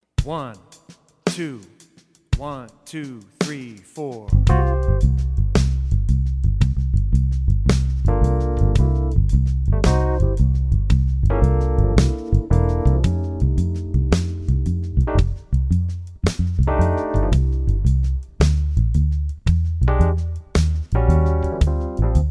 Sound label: C Blues Shuffle